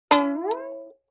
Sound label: Violin Pluck Pitch Rise; Vintage Cartoon; Musical Elements